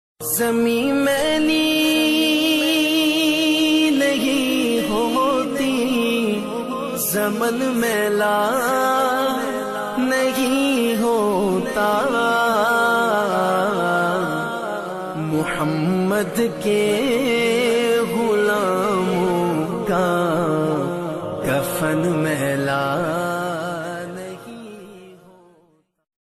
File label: soft naat ringtone